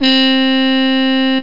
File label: harmon mute, brass; OctaMED SoundStudio V1; jazzies samples